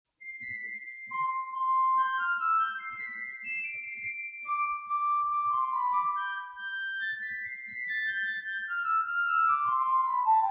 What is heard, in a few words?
Hornpipe, Ringtone